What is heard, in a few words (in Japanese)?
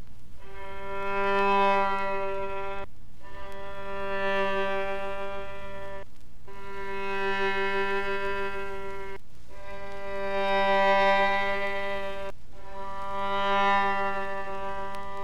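Stradivarius, 擦弦; 弦鳴楽器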